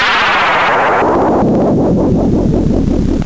warble

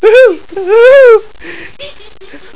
whoohoo

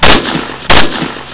gun

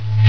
UNPOP

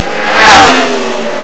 car

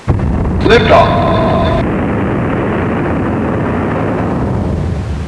CYBR LNCH

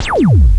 OTHR LASR

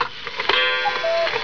CUCKOO; Windows

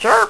NOISE

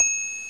CH COUNT, CHIMES

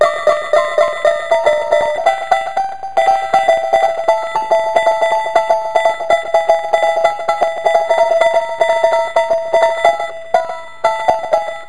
GUITAR

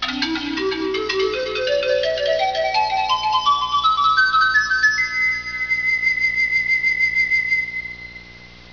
SYN FLUTE

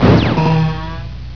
boing

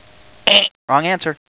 wrong

